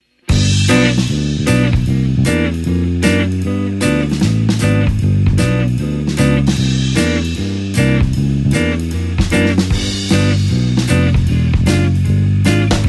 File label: swing 4 on the floor low position